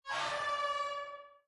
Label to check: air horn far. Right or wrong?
Right